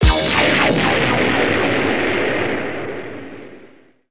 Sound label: Defeat; Advanced Circuits; DSiWare; NDS